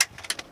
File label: Plane P40 Switch Toggle; Airplanes